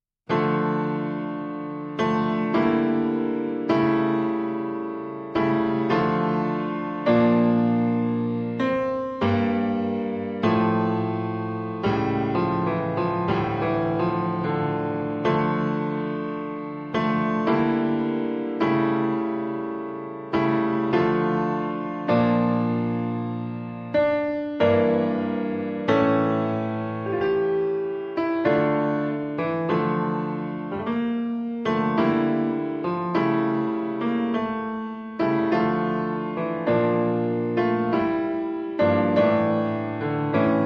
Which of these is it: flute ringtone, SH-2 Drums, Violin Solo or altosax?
Violin Solo